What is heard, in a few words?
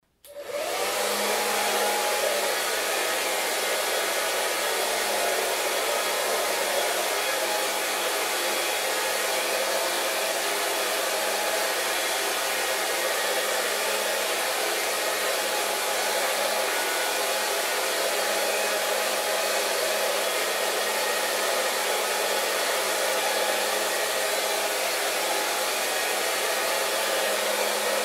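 fen dlya volos, hair dryer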